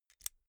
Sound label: insert xlr extension latch hard; objekte; elektronik